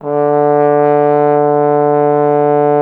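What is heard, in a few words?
Brass Trombone D; Miroslav Vitous - Solo Instruments VOL-1; 90 s Sample CDs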